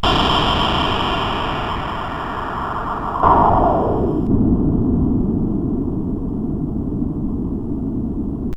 Thunder, CSG8580; CSG8580R5

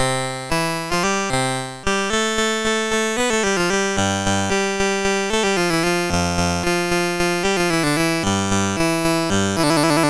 Bach, MOS6581R3; BMV977

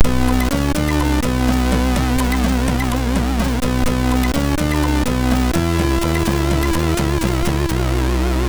Jumping Jack, MOS6581R2; Andy

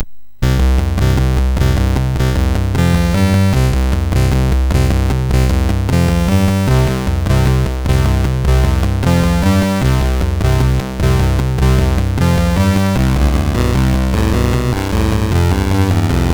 I Wanna Dance, MOS6581R3

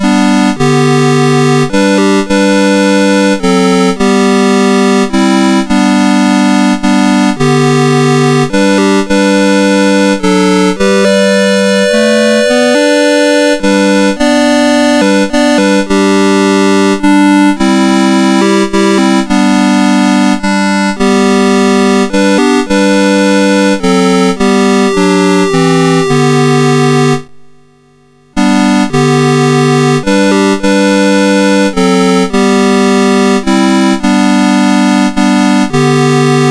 Amazing Grace, MOS6581R2

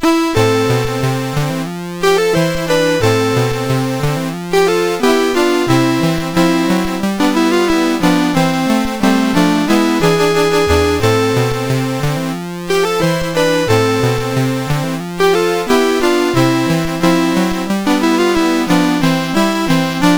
Walk done Run, CSG8580; CSG8580R5

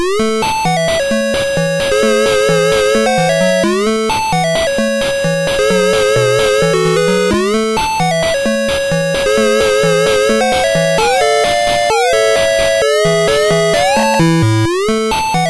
Musicbox, MOS6581R3; hvsc

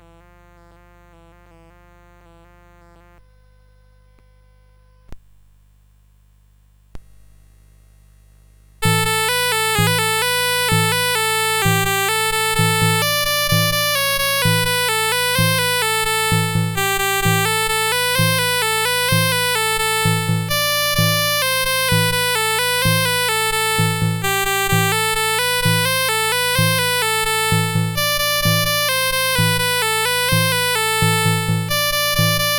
CSG8580; CSG8580R5

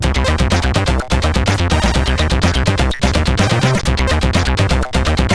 Eating Tune, CSG8580R5; Rokling Henning; Rastertime

